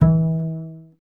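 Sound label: string contrabass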